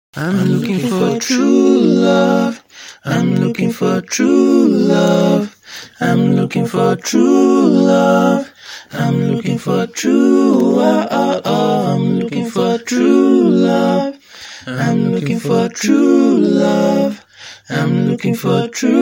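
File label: pop r&b 57 F major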